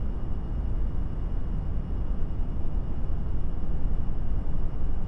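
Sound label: room tone medium; Star Wars Obi-Wan; XBOX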